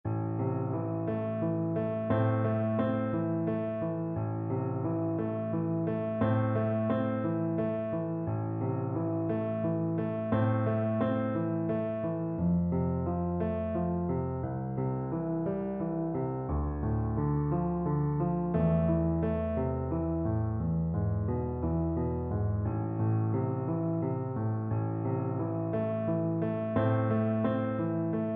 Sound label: Cello; Vltava